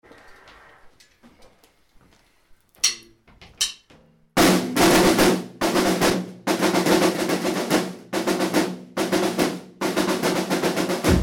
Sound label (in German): Rythmus